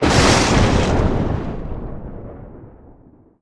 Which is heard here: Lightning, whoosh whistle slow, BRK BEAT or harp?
Lightning